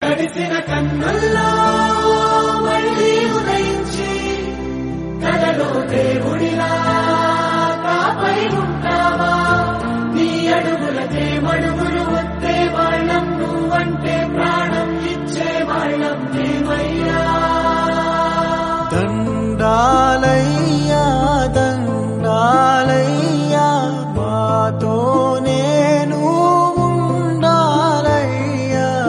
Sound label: south; baahubali 2